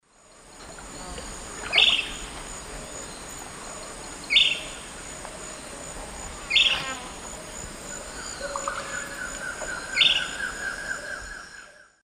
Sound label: Myiarchus ferox, dawn song; S. America; Eastern Lowlands